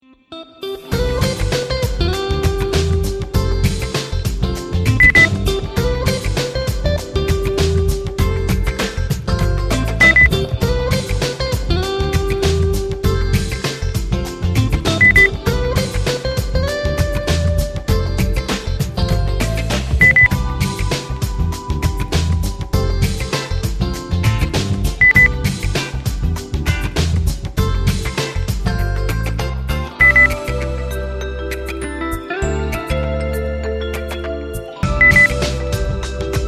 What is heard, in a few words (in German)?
gute laune sunshine guitar